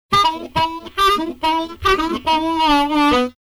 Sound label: 3 Bar Harmonica, 140 E